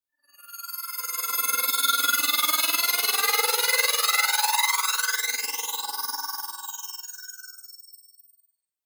Future Telemetry Strainge Simmering Spacecraft By High Sweep Flanging; Sound Design; Spaceship; Communication